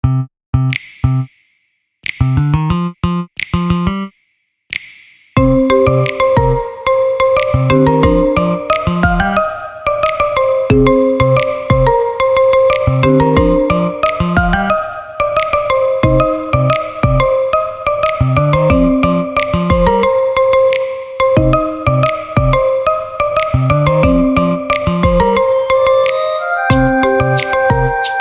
Instrumental Ringtone

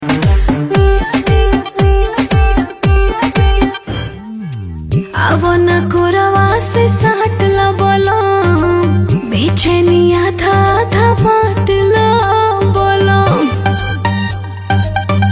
Ringtone

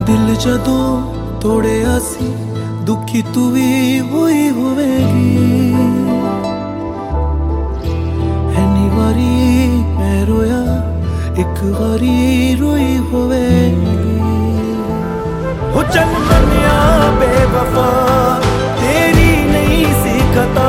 Song Ringtone

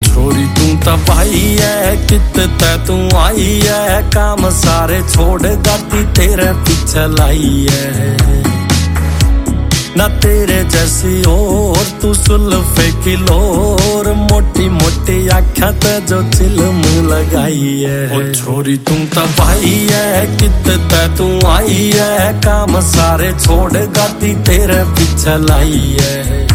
Song Ringtone

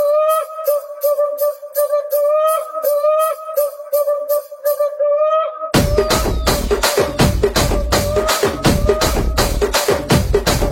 Instrumental

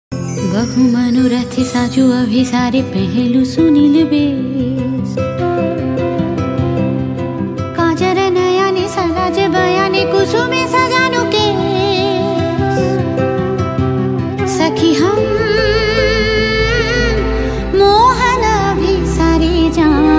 Ringtone

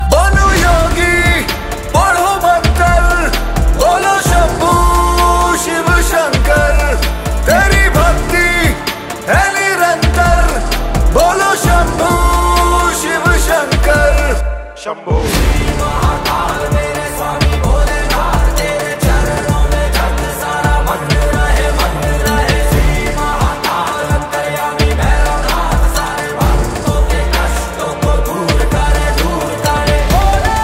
Mantar Ringtone

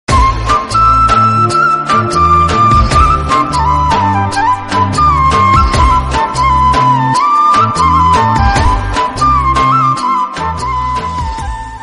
Flute; Urdu

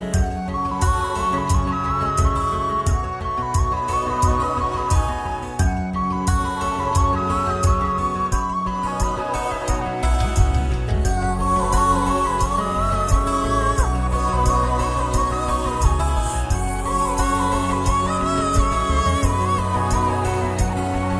Instrumental Ringtone

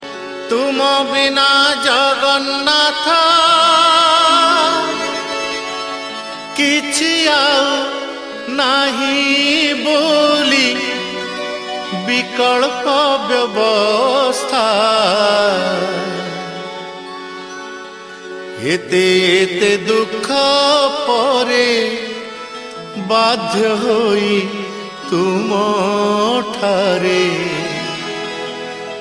Odia Bhajan

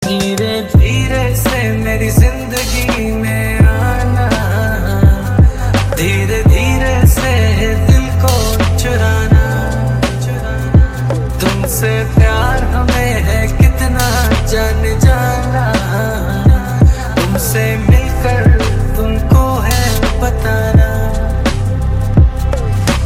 Chill Pop Mix

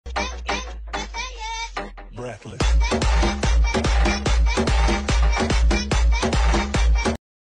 Remix Viral Ringtone

